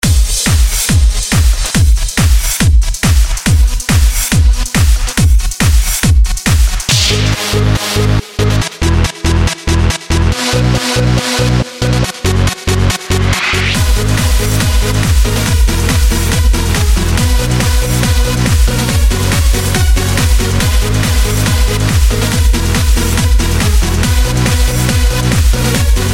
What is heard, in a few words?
club